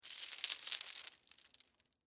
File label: Chain Movement, Industry, Chain, Movement, Heavy, Chain; Factory